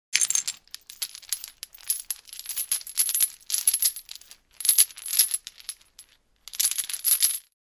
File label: Metallic chains rattle and movements